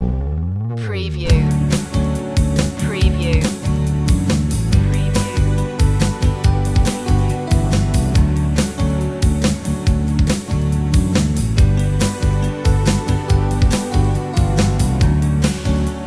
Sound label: vocals